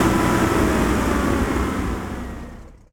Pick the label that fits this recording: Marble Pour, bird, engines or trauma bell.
engines